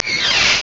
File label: door shut